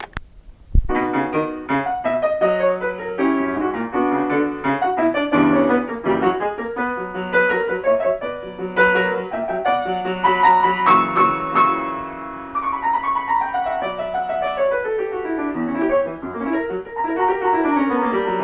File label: Piano